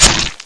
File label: headbite; aomdc; monsters; handcrab